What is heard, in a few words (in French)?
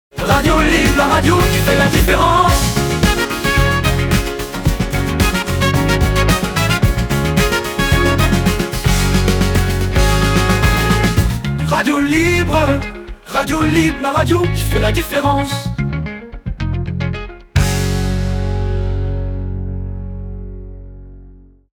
Jingles Radio Chantes Funky vintage; Radio Libre